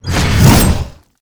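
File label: flesh golem attack, monster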